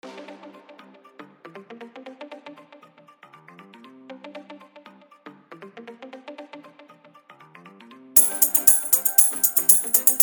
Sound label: Hard Dhollki Bass Mix